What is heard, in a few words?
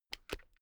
spectacles case open hard